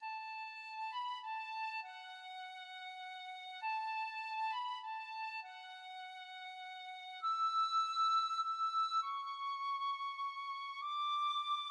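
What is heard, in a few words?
penny whistle, tin whistle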